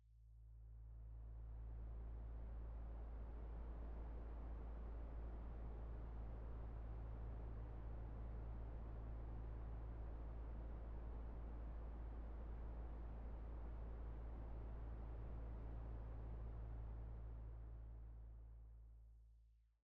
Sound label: signal; hardmagic